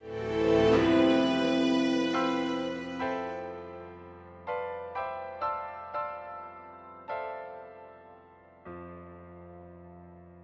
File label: full horns; Sky Children of the Light gamerip